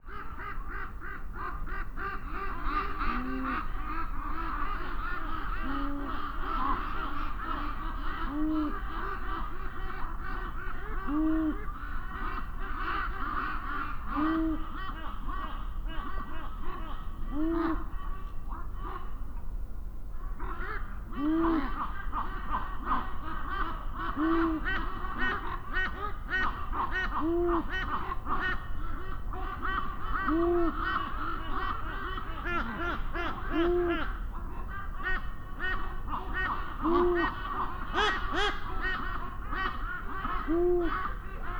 Canarian Long eared Owl Hooting of male in raven roost